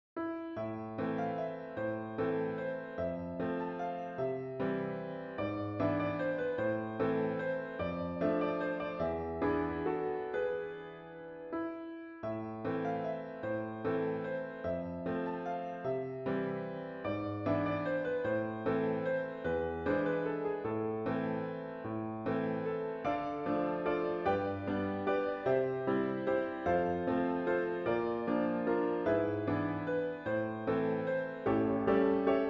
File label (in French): valse